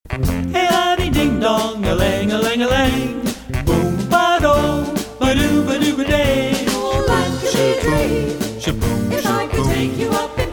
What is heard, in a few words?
Vocal Quartet; Jazz Spectrum Vocal Quartet; Swing